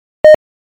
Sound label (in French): son court